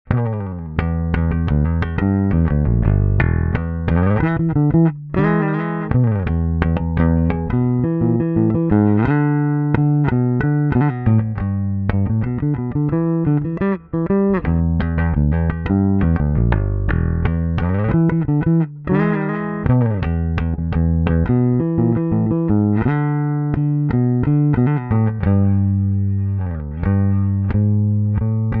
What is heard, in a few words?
passive finger